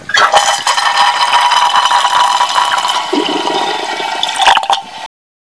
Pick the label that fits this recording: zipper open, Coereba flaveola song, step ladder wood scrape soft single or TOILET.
TOILET